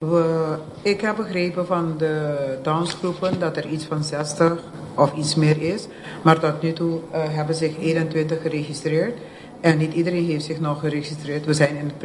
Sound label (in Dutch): Orgel